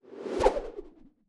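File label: infantry javelin, weapon; Battle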